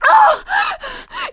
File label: scream while pounced, survivor voice; producer